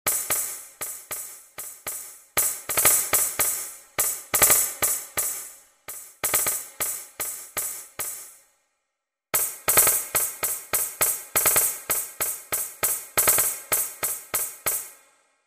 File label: Tambourine